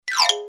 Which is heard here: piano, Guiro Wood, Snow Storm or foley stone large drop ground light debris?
piano